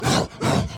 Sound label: Enraged Breathing Loop; The Town; Burns